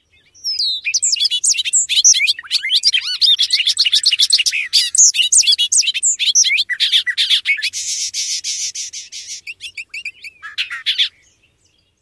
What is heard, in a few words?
extended song given in flight